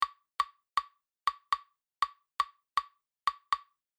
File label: Clave Fast